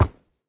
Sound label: wood, horse; mob; minecraft